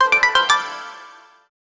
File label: game over game sound; negative